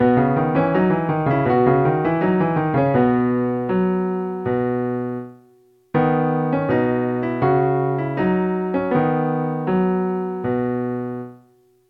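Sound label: practice dotted 8th note in B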